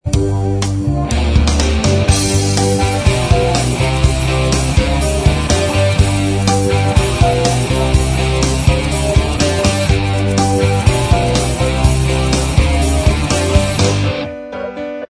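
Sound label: instrumental, backing track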